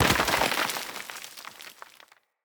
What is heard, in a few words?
Impact Debris Medium Dirt; Weapon Impacts